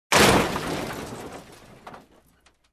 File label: Crash or Collision Sound